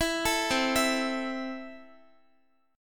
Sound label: C 6th C down Guitar; arpeggiated